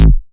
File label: Bass A, Offbeat Bass; VEC1; Club